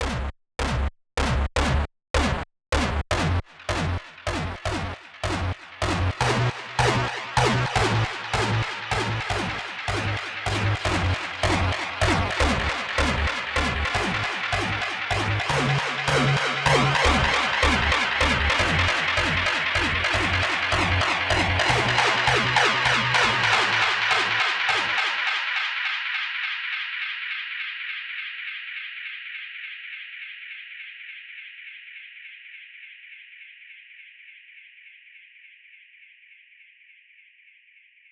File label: bass